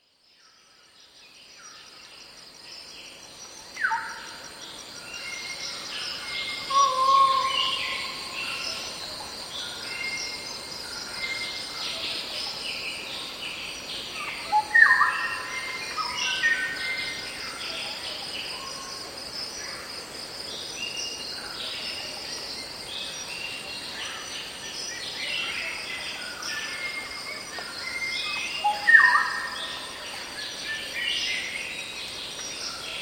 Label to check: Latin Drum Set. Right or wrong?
Wrong